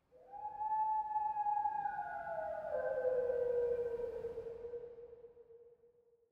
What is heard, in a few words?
long wolf; ambient